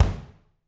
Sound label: BD, Drums; Bob Clearmountain Drums I